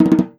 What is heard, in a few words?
Perc Djembe Roll